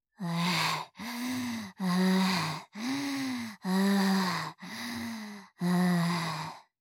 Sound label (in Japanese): 息切れ(余裕無い)